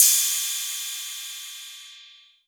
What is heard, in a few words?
808 Cymbal